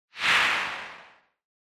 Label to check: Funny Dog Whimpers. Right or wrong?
Wrong